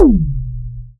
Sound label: pulse; agentprojectcontext